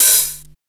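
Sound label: 150 hip hop, Big Beat Drumloops; USB Soundscan vol.25; AKAI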